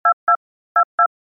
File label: TV